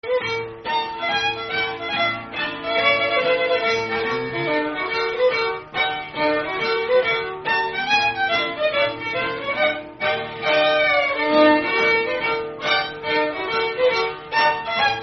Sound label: Schottische; Nelson; Nameless Schottische